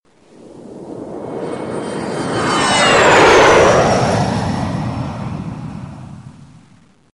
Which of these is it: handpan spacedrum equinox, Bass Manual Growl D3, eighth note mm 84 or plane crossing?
plane crossing